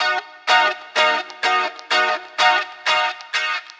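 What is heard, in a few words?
Freeze gtr, guitar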